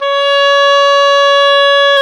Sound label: oboe, mf f; Roland L-CDX-03 Disk 1; Oboe 2&3